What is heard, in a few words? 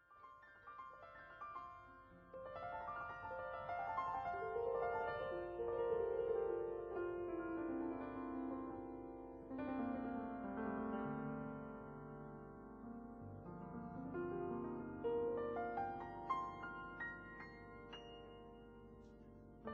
Peaceful Lullaby